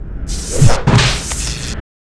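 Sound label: Mob attack; Monster; 흑수사; 좌우호법; 2단계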